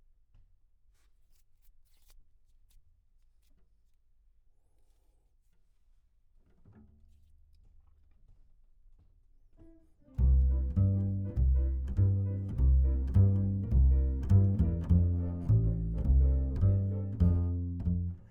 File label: Bass